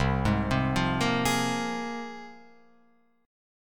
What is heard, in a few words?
C 7th Suspended 4th Sharp 5th, Guitar, Open F; C7sus4#5 arpeggiated